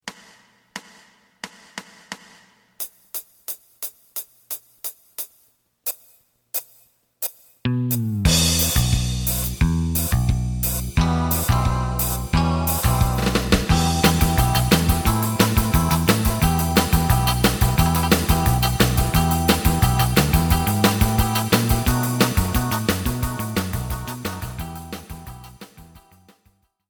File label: lead